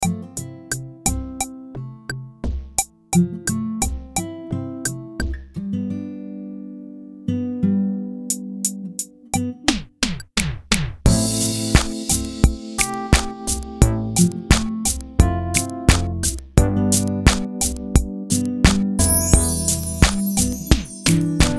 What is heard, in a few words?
instrumental; varga katalin